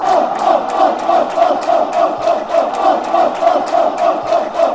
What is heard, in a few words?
off off off, fan chants; hufc